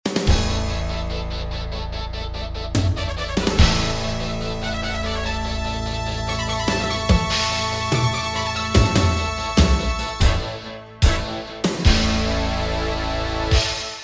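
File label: for the school principal's entrance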